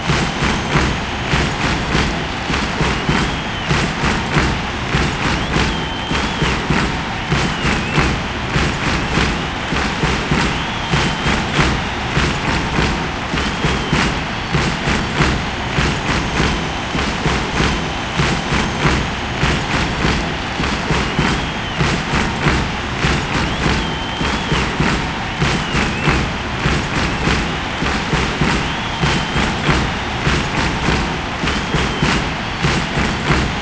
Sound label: chant; Strikers